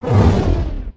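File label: lower terrain, interface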